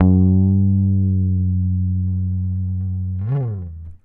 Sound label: GTR TRIK, Jazzistic; Univers Sons